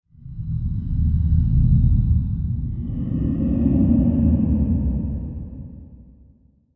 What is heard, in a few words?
Animal Breath; Eerie Slow Wheeze, With Reverb. Use For Monster.; Animal Vehicle Growls